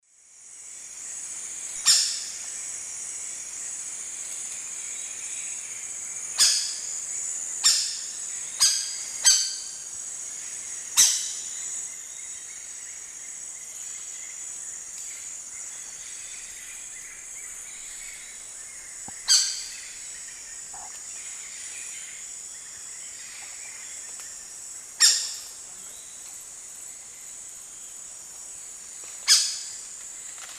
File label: Phoenicircus nigricollis, calls female; S. America; Eastern Lowlands